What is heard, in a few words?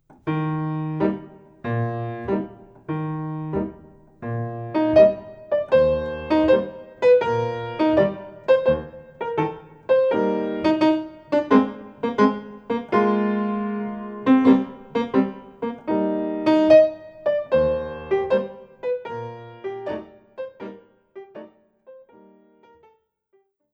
battements tendus jete in 1 beat